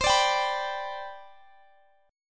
C Major 7th Suspended 2nd, C, down, Guitar, Standard; strummed; capo=2